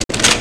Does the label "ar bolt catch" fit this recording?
Yes